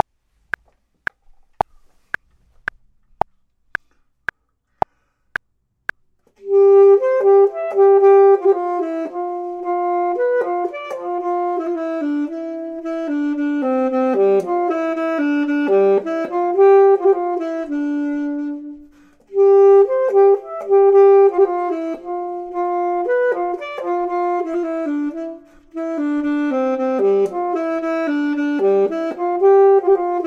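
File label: top, Saxophone and Clarinet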